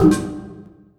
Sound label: Oneshot